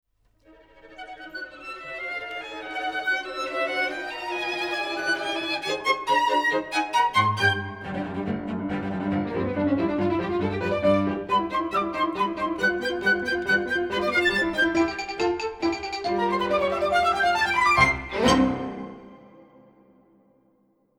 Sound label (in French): Bourree fantasque